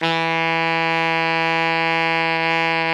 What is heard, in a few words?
ALTO MF E 2, Sax; Greytsounds; Philip Wolfe David Crozier's Saxophones